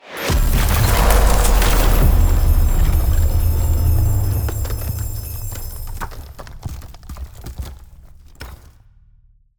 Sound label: Ice Spell Summoning Summon Ally; Arctic Aura